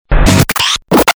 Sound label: Sweet Buster, Breakers & Transitioners; Best Of N2O Library